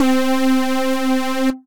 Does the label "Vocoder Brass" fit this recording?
Yes